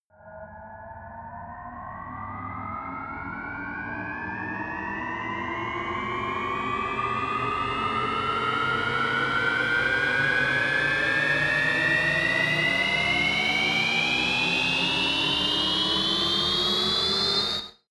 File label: turbine start